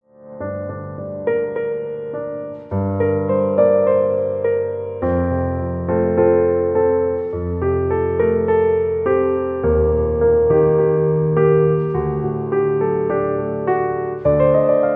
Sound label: Piano